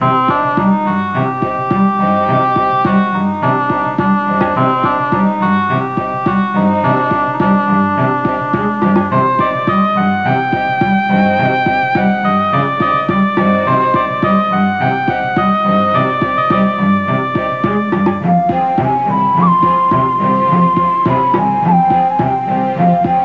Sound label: Egyptian Style; 1:54 min.; RCT